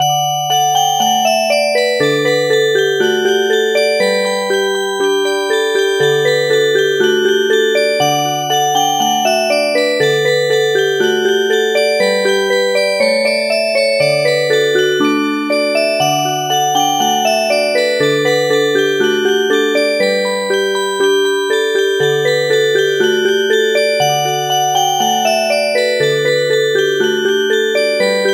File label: Reel Music Box; midi; traditional